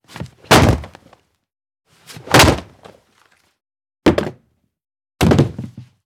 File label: Misc Hardwood x4